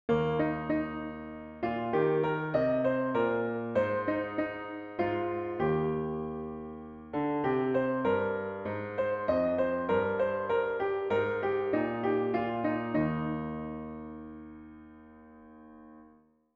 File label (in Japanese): ピアノ